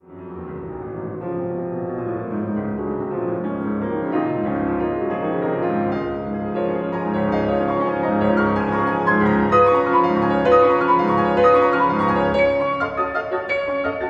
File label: Etude Tableau fis-moll Allegro molto